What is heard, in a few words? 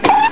toon noise